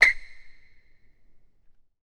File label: Basketball Court